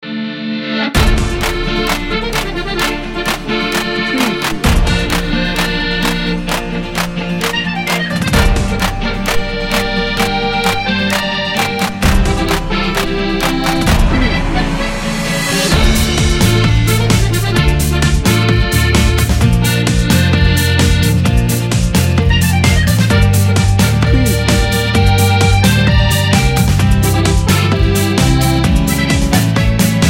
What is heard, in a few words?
latin pop dance